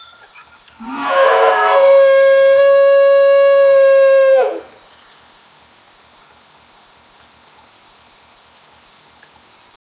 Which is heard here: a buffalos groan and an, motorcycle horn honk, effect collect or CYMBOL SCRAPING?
a buffalos groan and an